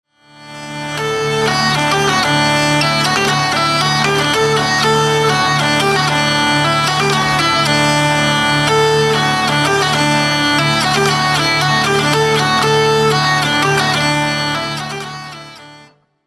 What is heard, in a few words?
lira